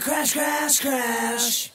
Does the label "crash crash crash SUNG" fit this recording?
Yes